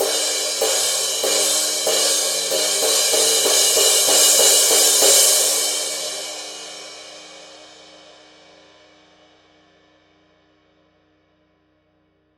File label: wild crash pattern; paiste; rude